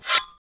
m79 shell out; weapons

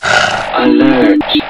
gonome pain; gonome hev

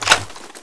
m16 clip in; weapons

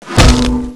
pipe hit bod; weapons

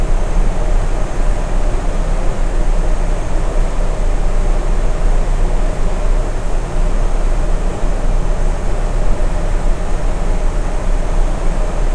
airflow; ambience; caged

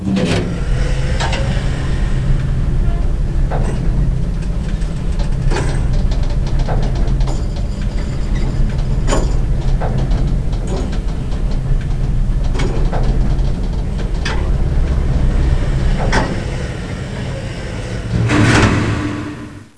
elevator; cg elvator03

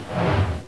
pipe miss; weapons

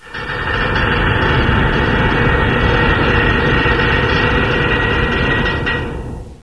groan; caged

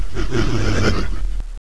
attack; sven; aomdc; monsters; twitcher2

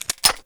reload

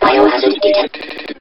gonome throw; gonome hev

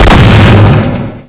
spas12 dbarrel, weapons

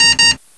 beep, ambience; caged

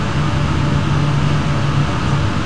hum; sven; caged; ambience

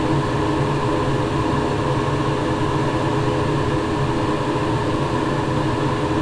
ambience; caged

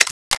xbow draw, weapons

